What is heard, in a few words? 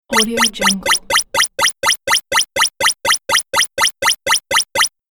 scoring points retro tally